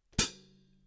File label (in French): H-H ping ferme, cymbales